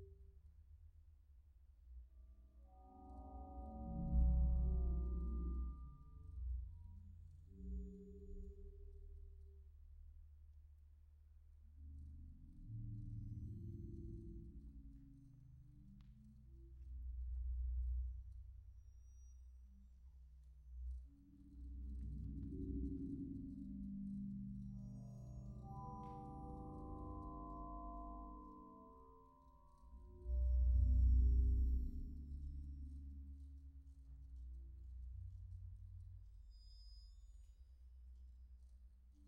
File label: concert ambisonics